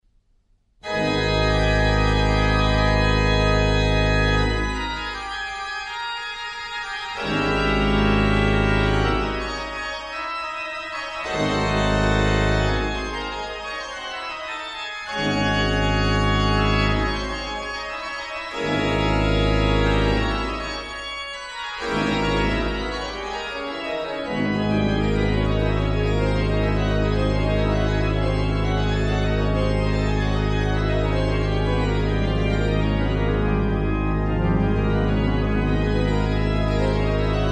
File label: fantasy and fugue g minor; bach